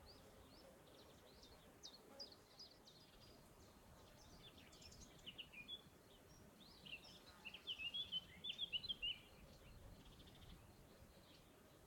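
Ambience Countryside Day Flying Insects Birds Loop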